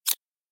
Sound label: click short; Stargvze v2